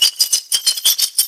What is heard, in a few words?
coin shake, Drum loops